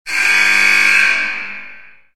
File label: Buzzer